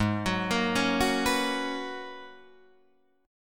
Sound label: G sharp Minor 11th G sharp Guitar Drop D, chord